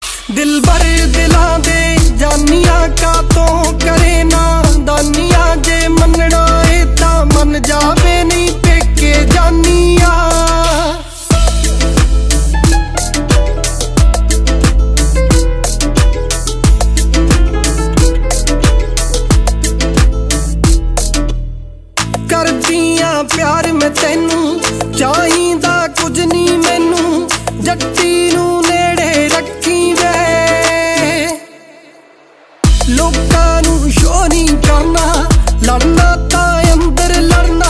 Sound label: New Punjabi Songs